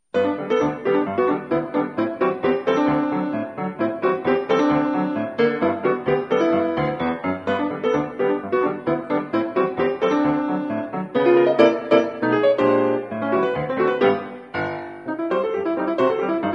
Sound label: Ragtime